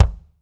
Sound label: BD, Drums; Bob Clearmountain Drums I